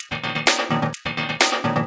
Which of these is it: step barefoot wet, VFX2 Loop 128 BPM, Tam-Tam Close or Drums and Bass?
VFX2 Loop 128 BPM